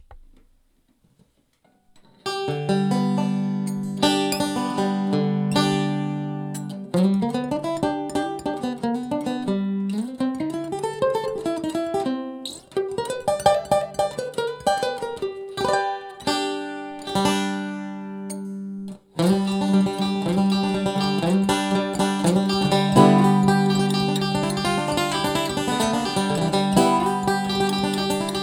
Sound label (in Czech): Banjo snimac